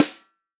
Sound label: snare drum, POP SNARE CHROMATIC